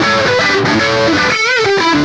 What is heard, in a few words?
Rythmn Riff in E, Guitars